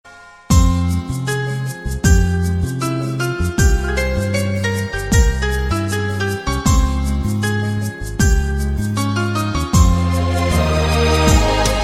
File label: romantic